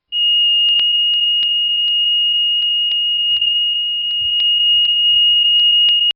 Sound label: Smoke Alarm; Alarms; Electronics; Bobby Cole